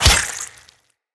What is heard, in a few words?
Melee Sword Impact Flesh